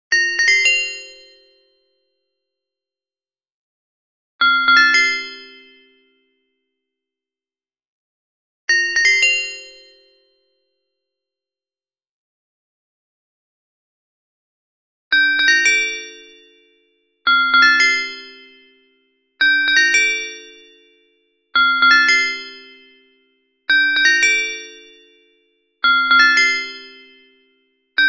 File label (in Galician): Muineira CARILLON SOPRANO; Muineira de Lugo